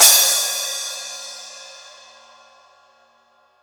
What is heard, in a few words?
splash 12